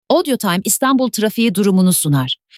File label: trafik intro